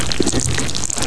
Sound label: Bigworm Die